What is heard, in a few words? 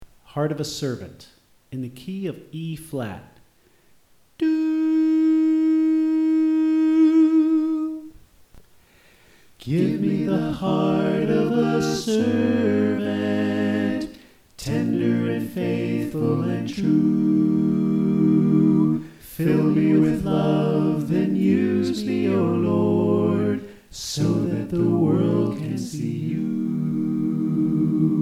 Tenor Left